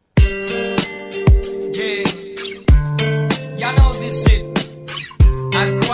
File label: rap; viet rap